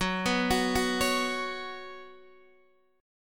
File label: Gb Suspended 4th Gb, down, Guitar, Open D